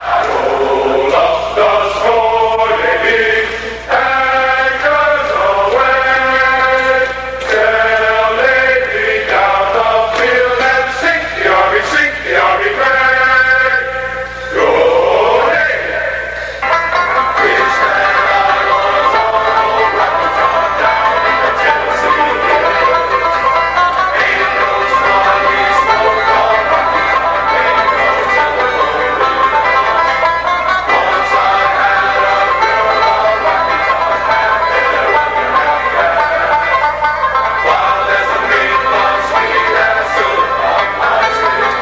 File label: Navy Choir; U.S. Navy Choir